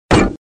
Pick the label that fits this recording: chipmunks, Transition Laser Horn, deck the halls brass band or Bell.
Bell